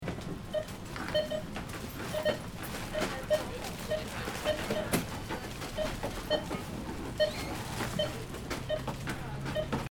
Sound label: shop loop